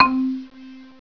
MARIMB C3; Alone in the Dark Demo; Adventure

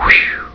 win fighters; Galactic Empire 1.01

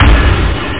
bass drum; OctaMED SoundStudio V1; drumkit; bassdrums

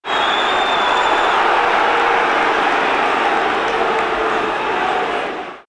mt big jeer

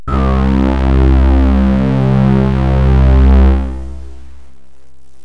synth fat; Terra Sound Library; construction set

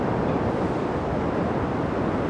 ocean; Alexander; Nature

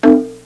conga drum, percussion instruments; Sound Effects for Multimedia - Volume 1

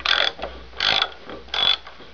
RATCHET

